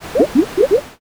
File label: poison